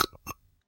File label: sip